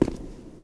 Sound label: footstep subway